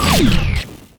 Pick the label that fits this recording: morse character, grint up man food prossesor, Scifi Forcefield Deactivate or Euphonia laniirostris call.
Scifi Forcefield Deactivate